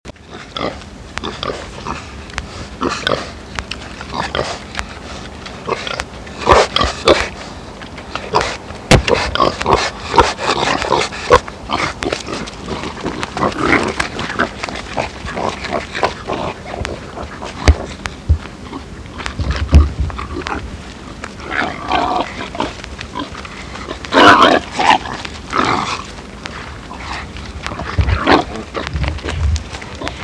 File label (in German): schweine